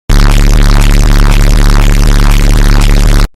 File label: Bass boosted meme